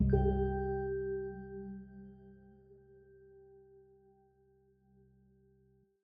Voice Trigger Training FX; iOS 27

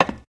glass step; Golden Crayon Sounds

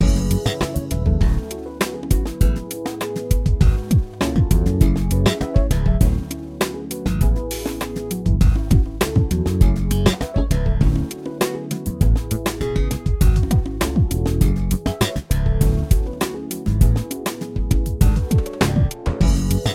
main music; RS Games Sounds; Threes

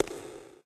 cave grounds step; Golden Crayon Sounds

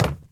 wood land; Golden Crayon Sounds

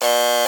lose challenge; RS Games Sounds; Uno

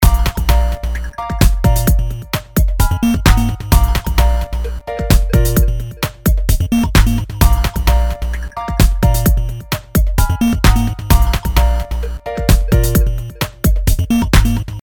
main music; RS Games Sounds; Shut The Box

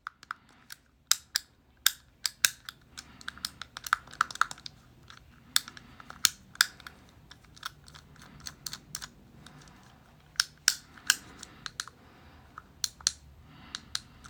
fidget cube; Golden Crayon Sounds

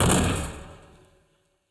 roof land; Golden Crayon Sounds

